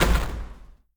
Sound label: door open; Crypt of the NecroDancer